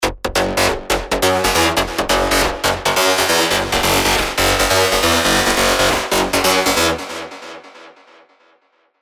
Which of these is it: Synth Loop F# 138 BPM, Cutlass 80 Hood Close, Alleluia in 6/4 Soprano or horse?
Synth Loop F# 138 BPM